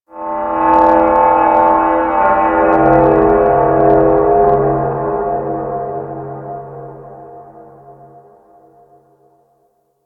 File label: Glass Texture Large, Ambience; Warframe; Cephalon Weave; DE